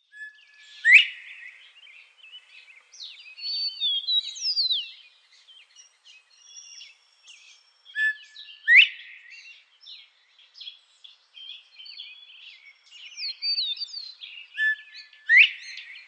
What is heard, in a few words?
Northern Bobwhite male display